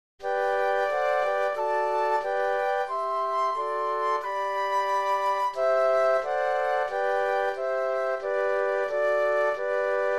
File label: Flute Quartet